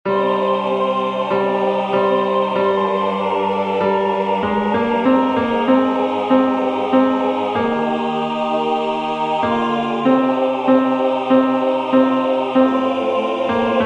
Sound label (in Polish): tenor; Dzieki Ci Panie